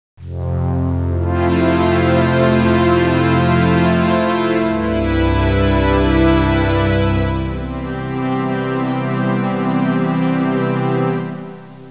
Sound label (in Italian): audio suonerie musica mistica